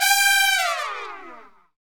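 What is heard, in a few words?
brass, Tpts FX Falls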